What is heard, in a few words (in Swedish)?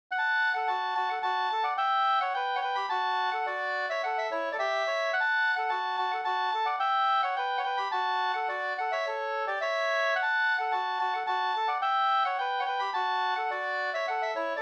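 polska, tvåstämmig; Hogdal; Artur Lundberg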